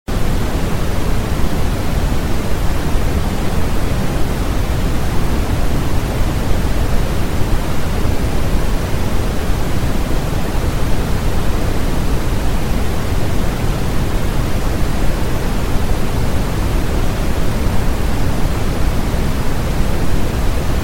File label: white noise; sleeping sound